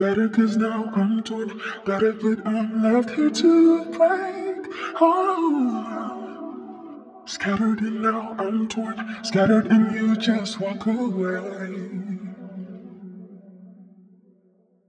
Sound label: Vocal Loop 129 BPM E Min; I'm Torn; Cymatics; ANALOG EVOLUTION Beta